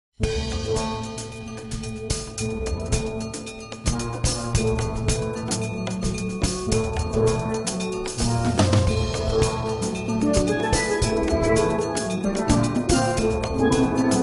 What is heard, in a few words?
Samba